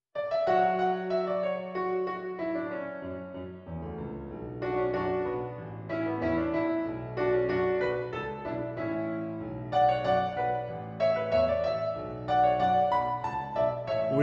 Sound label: Sea Shanty TTB Balanced; Yuletide